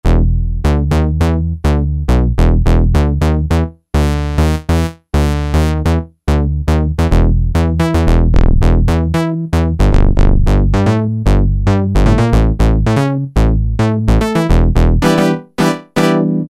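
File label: bass-Juno 1; E-mu Orbit V2; Factory presets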